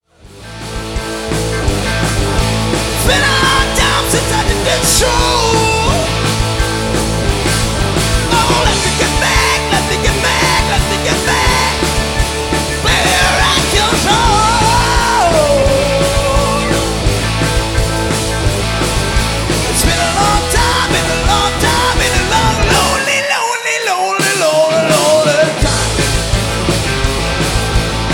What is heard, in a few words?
Rock n roll